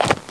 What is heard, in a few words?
Centaur Hooves; Playable Tutorial Level; TR1